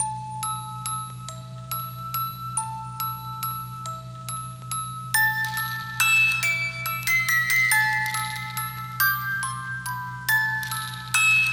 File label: musicbox, 140